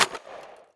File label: last shot mech, guns tanks